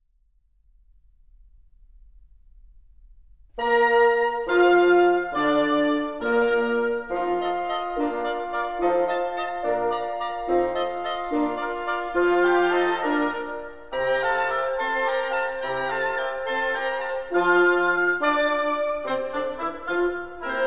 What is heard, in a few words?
Divertimento in Bb, Allegro assai; Mozart; Serenades and Divertimenti for Wind